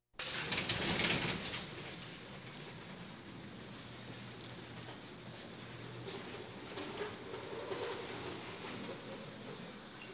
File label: Police, Fire engines